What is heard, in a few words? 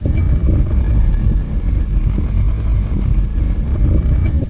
door move; dod; doors